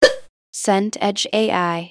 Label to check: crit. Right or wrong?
Wrong